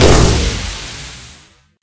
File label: bumpmine detonate; Counter Strike Model Pack; CS:GO; survival